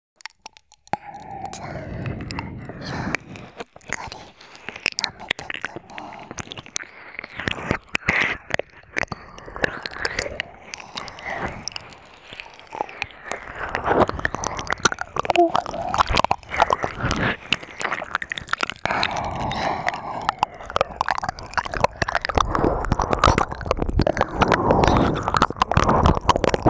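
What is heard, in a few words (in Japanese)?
両耳から奥まで耳舐め, ASMR; 両耳からぐっぽり奥まで耳舐め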